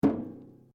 RUBBER ON METAL HOLLOW; Materials

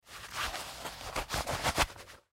Gloves Leather Put On Hand; Dressing; Human; Blastwave

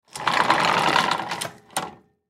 Projector Screen Lower; Blastwave

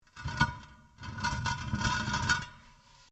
METAL ON STONE LARGE SCRAPE; Materials

